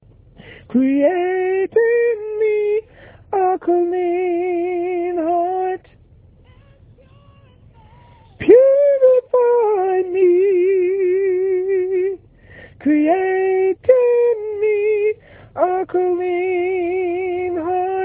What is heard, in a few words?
Create in me Alto